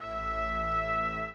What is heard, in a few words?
String